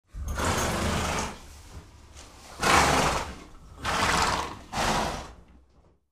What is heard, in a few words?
Horse snorting